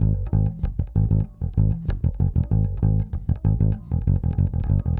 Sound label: Bass, 70s Analog Funk